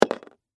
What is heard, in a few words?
Game Piece Drop, Metal, On, Board; Sports; Monopoly; BlastWave